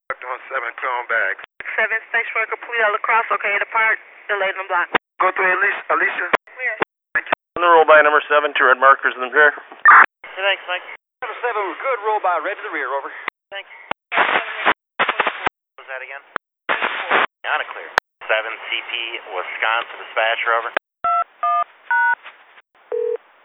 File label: Amtrak